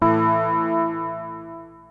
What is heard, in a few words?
PPG A2, Synthesizer; Hollywood Edge; Giorgio Moroder Rare Synthesizer Collection; PPG.2.3